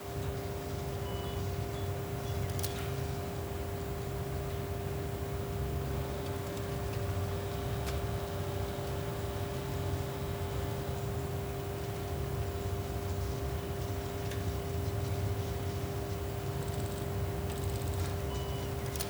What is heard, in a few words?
Ambience Office; bratz girlz really rock; ps2 wii; Stylesville